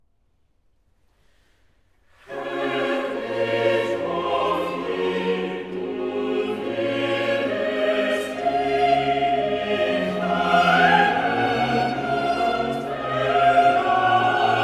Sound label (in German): Choral, cantatas; Herr ich hoff je; Bach; BWV 184; Erwunschtes Freudenlicht